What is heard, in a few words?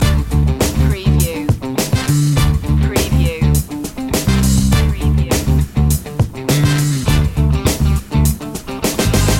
soul beat loop fill